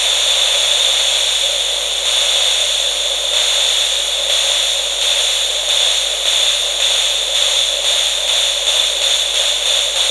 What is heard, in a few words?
misty speeding up